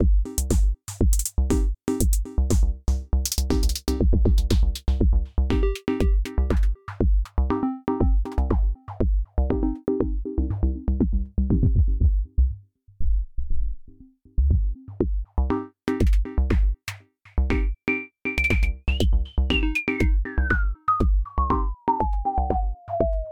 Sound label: sum FX Filter